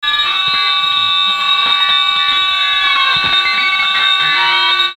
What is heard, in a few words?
Japon Fuzz